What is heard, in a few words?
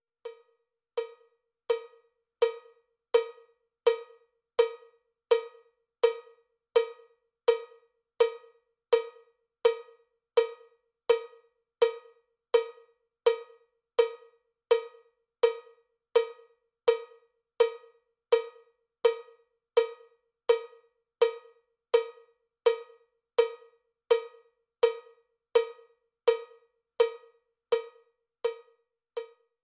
cowbell click track bpm 79; cowbell click track bpm 83